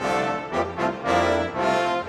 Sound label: Brass